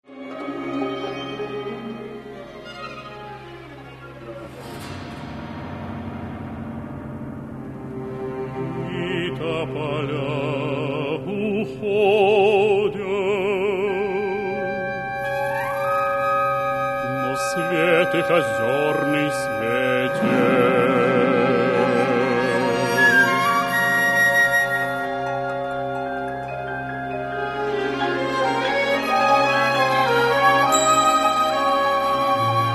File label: chamber